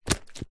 footstep single shoe female boot leather buckle wet mud